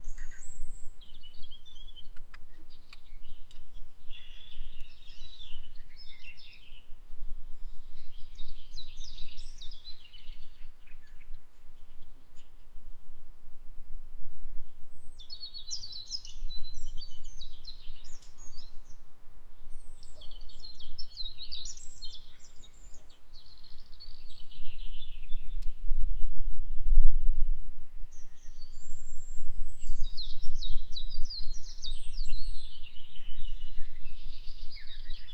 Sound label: english cottage garden, cottage